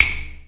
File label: snap